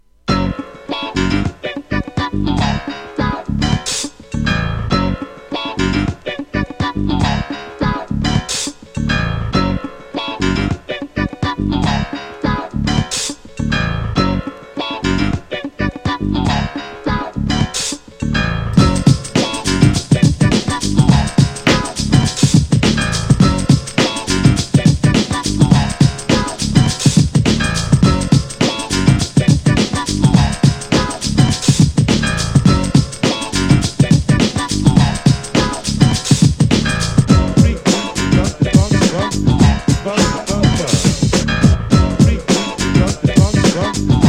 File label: jazz not jazz, free the funk